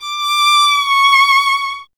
STR D 6, Solo Instruments Vln; Miroslav Vitous

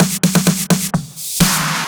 Fills 128BPM, Clubsounds

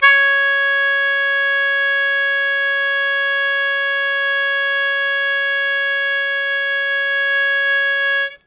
Loud Attack Sustain C#2, Harmonica; Zero G - Harmonica

